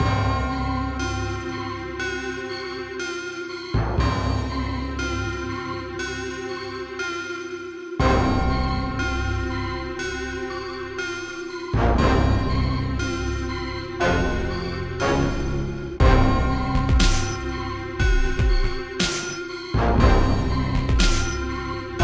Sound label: dungeon